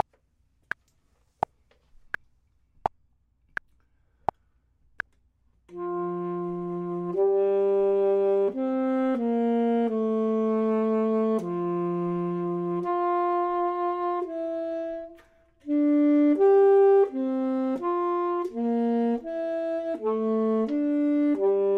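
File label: bottom, Saxophone and Clarinet; voxman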